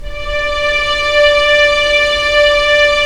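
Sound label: STR String Section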